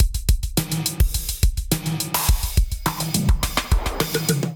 Highway Kit 105 bpm, Drums; MIDI Clips; Drive and Glow; Ableton; Factory Packs

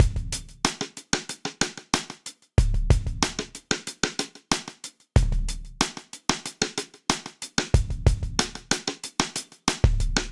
Sidestick Old School 93bpm; Clockwork; Ableton; Factory Packs; Session Drums Studio

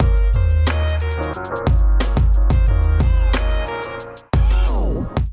Paradise Kit groove 90 bpm; MIDI Clips; Ableton; Factory Packs; Beat Tools

